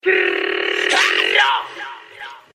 brrrrrr, Voice; ooh ahh

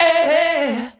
ay hey, SUNG; Voice; ooh ahh

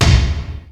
muddy, snares, electro

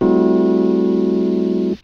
vibro, Synths; Notes

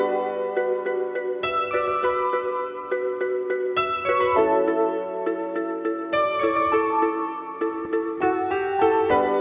keys, phonky loops

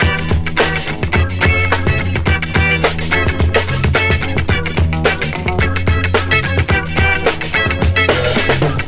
guitar, Loops; Phonky

